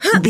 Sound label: voice female effort jump action